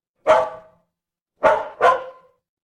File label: animal dog bark